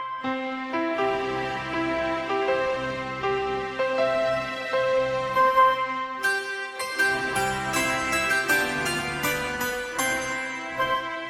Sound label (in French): Contradanse